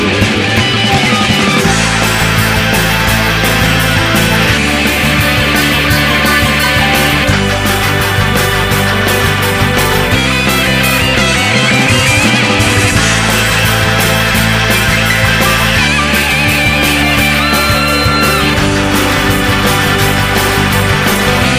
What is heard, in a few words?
chacha solo